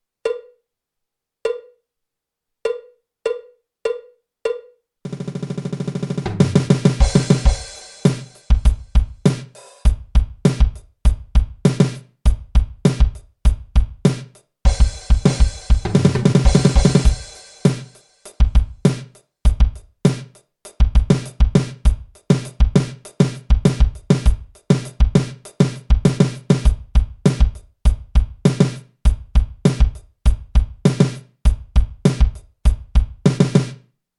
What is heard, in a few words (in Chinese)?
架子鼓独奏 摇滚融合; 66条架子鼓独奏SOLO